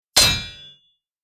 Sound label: Shield impact clang hit